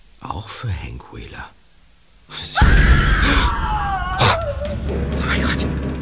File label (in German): Schrei